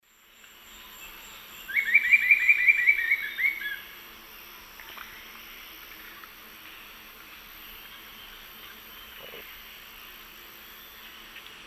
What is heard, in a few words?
Attila spadiceus, calls or alternate song; S. America; Eastern Lowlands